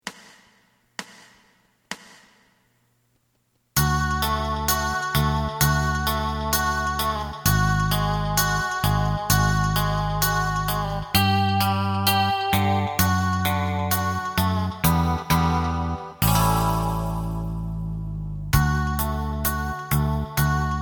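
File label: lead guitar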